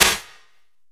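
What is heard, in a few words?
FX SHAKER; AKAI S-Series CD-ROM Sound Library VOL-5; FX PERC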